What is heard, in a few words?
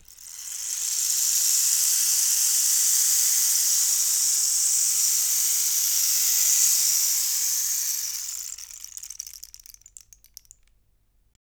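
rain stick; Univers Sons - Raricussions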